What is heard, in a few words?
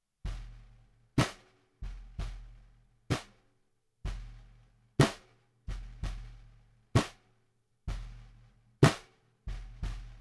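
snare bottom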